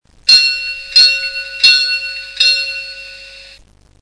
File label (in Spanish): campana, Locomotoras; SONIDOS ADECUADOS PARA LOCOMOTORAS; Decoders